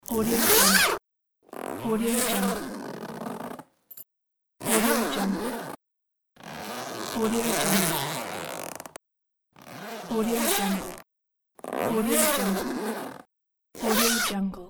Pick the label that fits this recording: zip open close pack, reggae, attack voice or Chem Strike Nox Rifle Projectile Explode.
zip open close pack